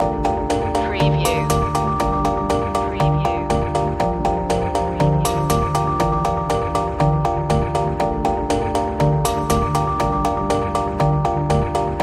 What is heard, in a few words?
interference loop